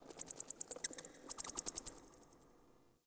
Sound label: Insect Night; Warframe; Eidolon Plains; Ambience